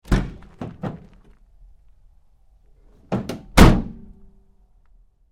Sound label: Truck GMC Savana Door Rear Open Close Hard; 2010 GMC Savana; Trucks